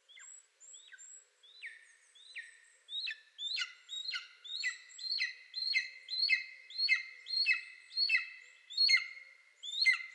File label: Golden Eagle - Aquila chrysaetos; raptors